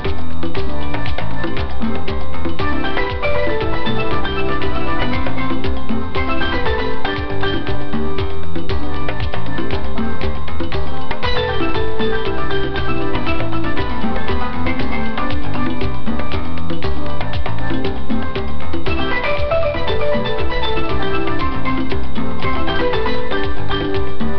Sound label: RYTHM